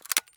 fal stock open; Escape from Tarkov

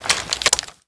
reload pull out, foley; m79

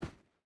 footstep rubber boots sand walk; Rust

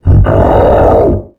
biomech air vce die

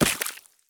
sprint puddle; Escape from Tarkov

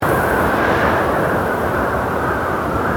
ambient battle; World Of Warships

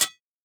flint strike; Rust

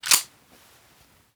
sawnoff shotgun pump forward; Rust

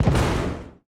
damage hits explosions, Warships

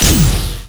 burst beam

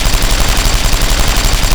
minigun loop close; buraq

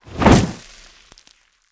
flame fall; Explosions

